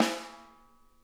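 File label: edge, snare; Sonic Reality; Interactive Drum Kits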